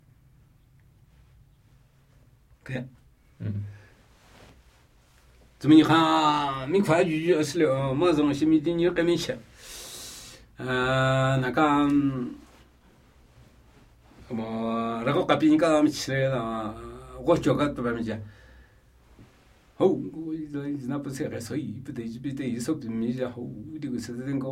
Namuyi Tibetan Ritual Chanting; Phi tca pi su

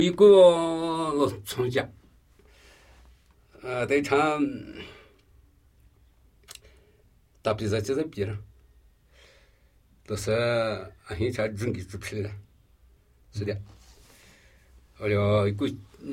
Namuyi Tibetan Ritual Chanting

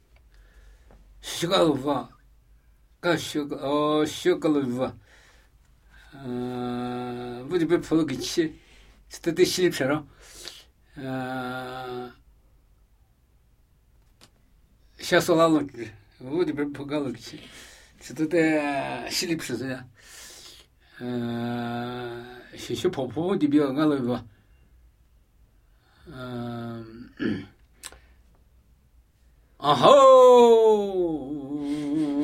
Namuyi Tibetan Ritual Chanting

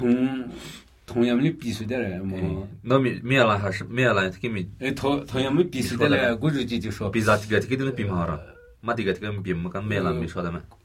Namuyi Tibetan Ritual Chanting

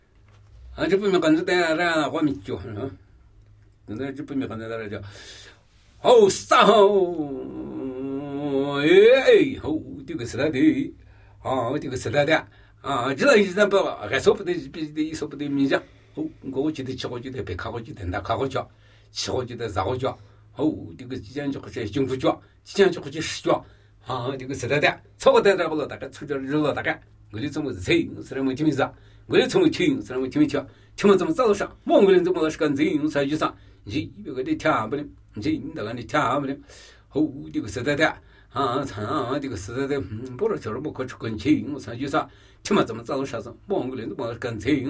Namuyi Tibetan Ritual Chanting

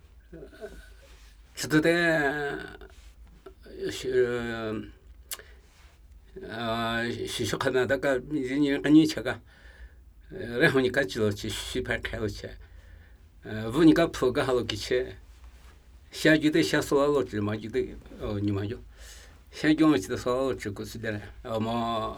Namuyi Tibetan Ritual Chanting

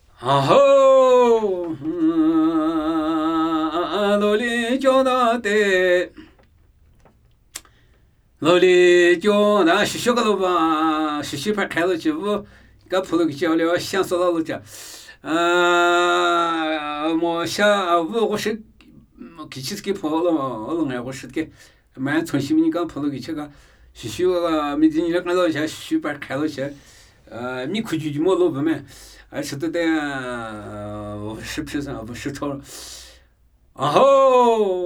Namuyi Tibetan Ritual Chanting

